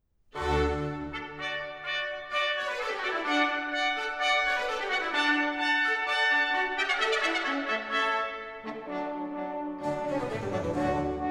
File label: Water Music Suite in D Major; Handel; Fireworks Music & Water Music